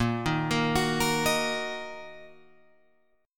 A sharp Suspended 4th Sharp 5th down Guitar Open F; arpeggiated; A#sus4#5; JGuitar